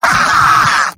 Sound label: Demoman laugh short